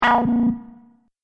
Multimedia Error, beep

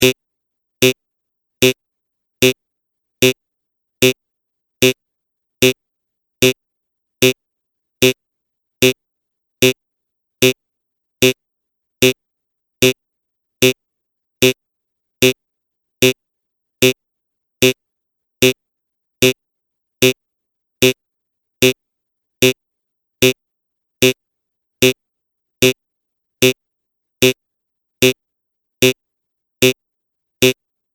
Timer Buzzer Clock; cartoon sound design

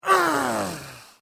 Male Grunt Human Injury Pain Vocal Ugh Ouch

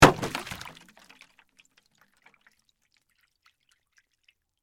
Jug Drop Burst, Open, Full, Water; Foley; Drops (Sound Effects:Objects)

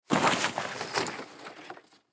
Lacrosse Equipment; SPORTS; Blastwave

